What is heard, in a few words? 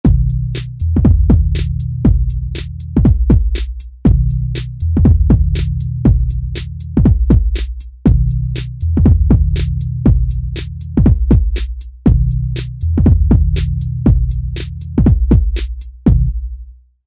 TripHop